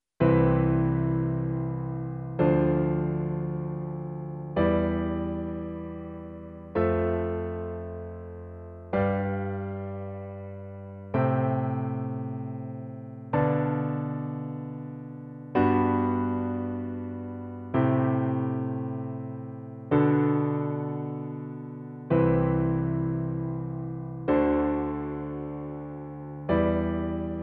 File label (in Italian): fisarmonica accordion, pillole di jazz accordi a quattro voci